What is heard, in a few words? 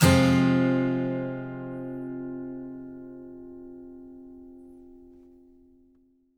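acoustic guitar F minor down, left; Country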